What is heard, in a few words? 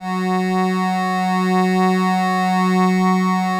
LAMBDA, String Machines